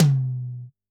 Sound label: Tom, drum